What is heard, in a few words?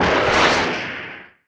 missile; Falcon 40